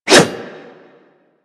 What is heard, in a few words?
Dagger Heavy Whoosh; Warframe; Weapons; Dual Daggers